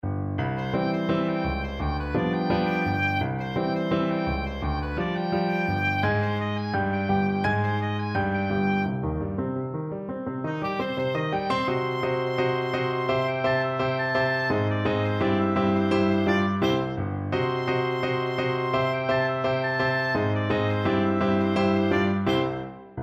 oboe

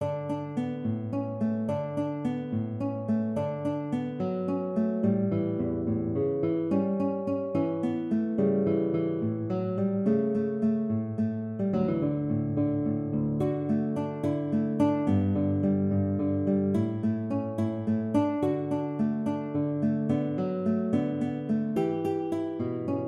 guitar

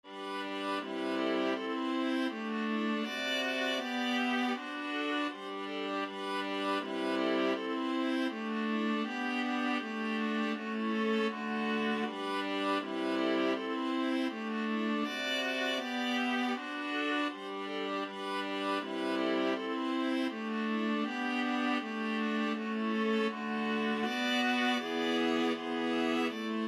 Bach Gavotte Cello Suite 6, viola trio

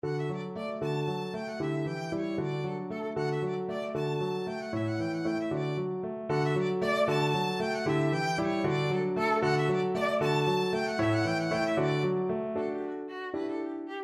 sonatina violin

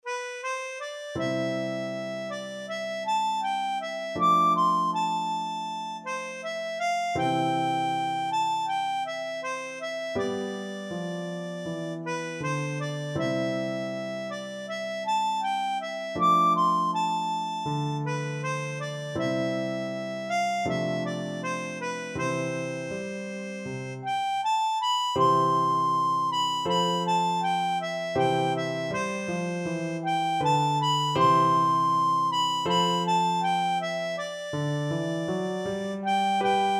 danny boy, harmonica

